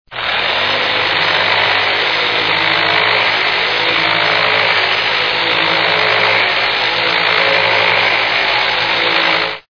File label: e sander; 1001 Sound Effects